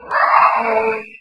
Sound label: die